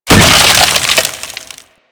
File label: Ice Ball Impact; Warframe; Warframes